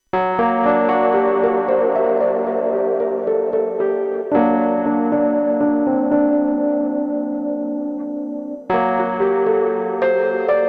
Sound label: MOD Devices MOD DUO X Piano Guitarix